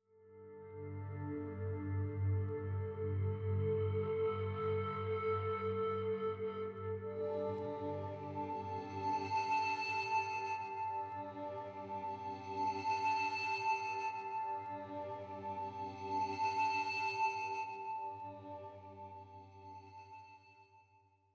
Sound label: Temple Explore Mood